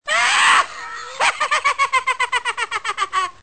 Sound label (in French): Sonnerie Bruitage - Le Rire